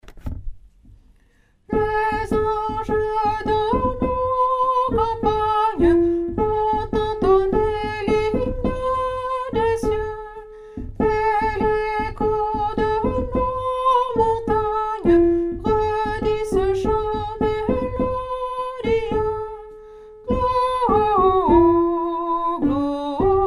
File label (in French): les anges alto; nouveaux noels; chants noel